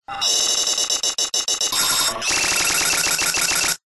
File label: science fiction machine glitch